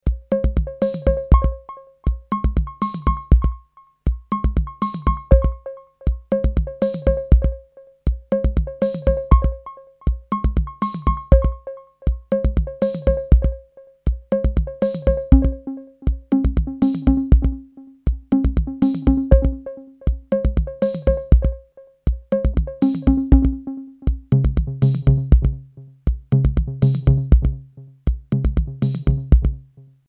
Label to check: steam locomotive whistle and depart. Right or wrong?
Wrong